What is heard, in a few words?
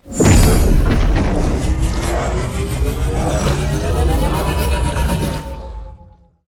spiders bossfight drain charge one Shot